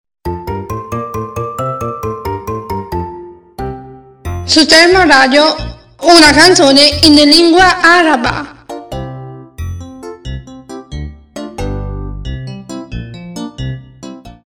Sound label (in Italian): Canzone in lingua araba